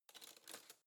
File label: cutlery spoon drawer grab soft